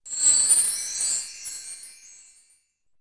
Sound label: connect; interface